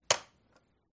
drop; Nick Summer Sports Stars

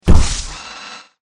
stomper; Danger Mouse - Ultimate

